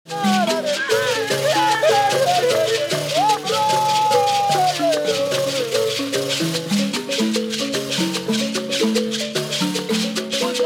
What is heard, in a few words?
mbira